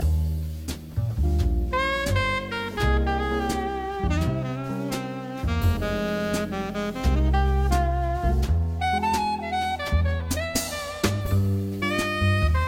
Jazz

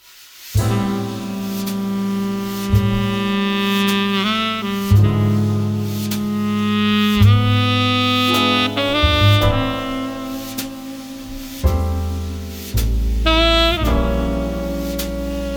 Swing, Slow Waves

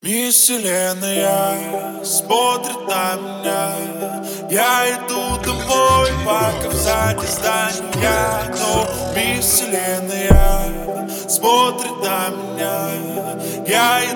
23-26Hz Low Bass; Screwed

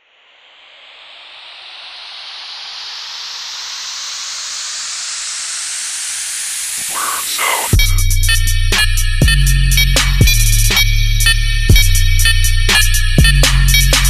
Arabian trap music, Screwed

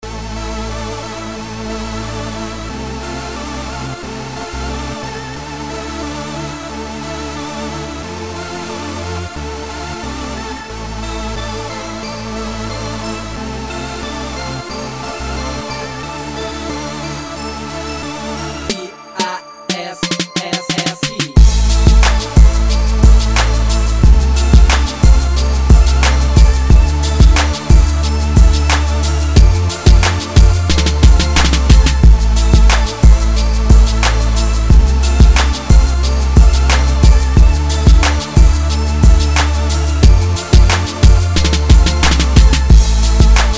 Bells Instrumental

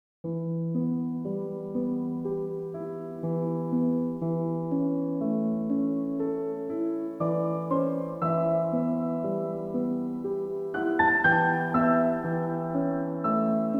Relaxing Piano Music

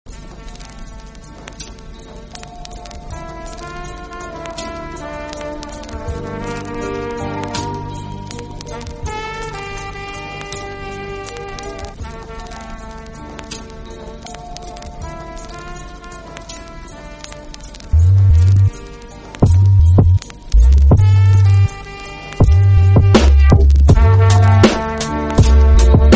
beat

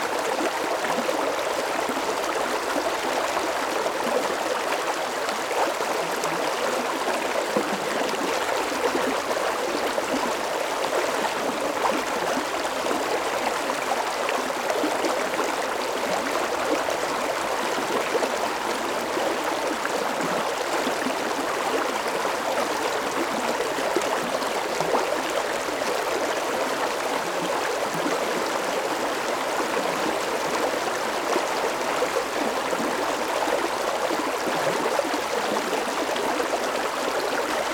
Rain - River Medium Stream Creek

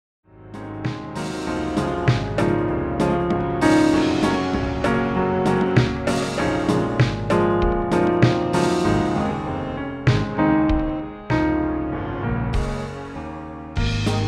Upbeat Love

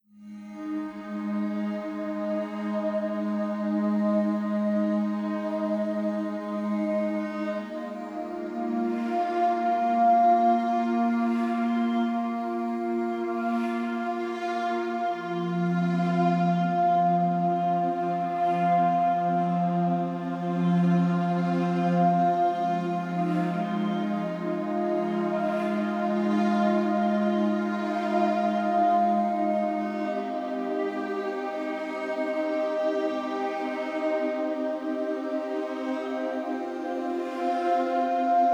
Meditation Music

